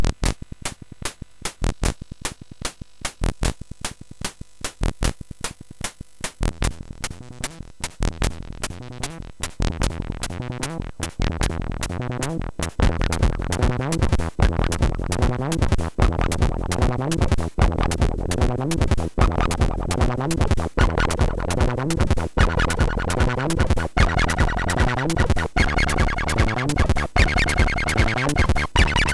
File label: C64 303 Emulator, MOS6581R3